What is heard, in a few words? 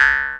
C# JAW, Primitive Instruments